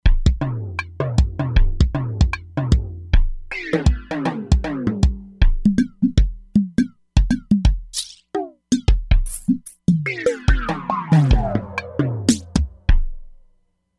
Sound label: Pitchin' Perc, E-mu Proteus-3; Factory patches